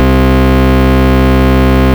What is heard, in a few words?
Ultimate Bass Root C; Vengeance Samples